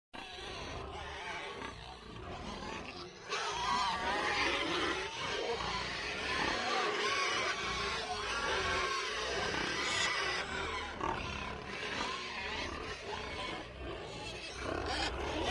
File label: PIGS GROUP BUSY DENSE; wildlife sounds